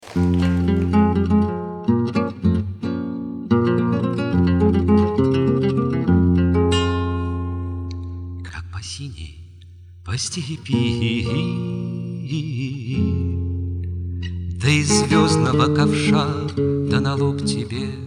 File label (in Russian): Колыбельная; М. Цветаева; Как по синей по степи; колыбельные; скифская колыбельная